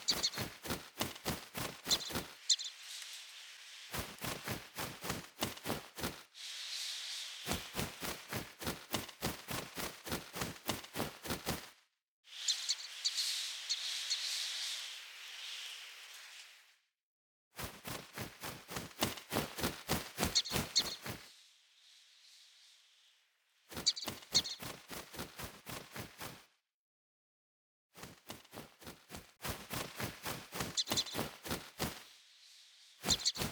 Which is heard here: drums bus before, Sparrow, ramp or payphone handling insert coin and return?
Sparrow